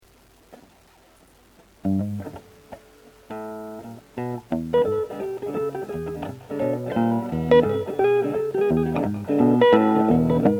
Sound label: blues